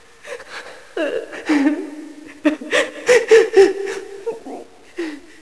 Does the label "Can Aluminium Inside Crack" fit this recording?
No